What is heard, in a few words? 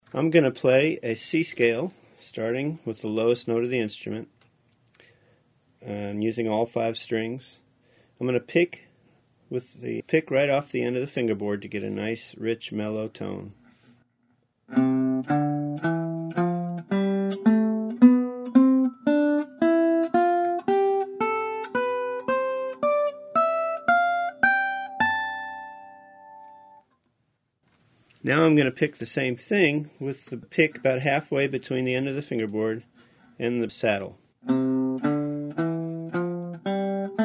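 Scales, mandolin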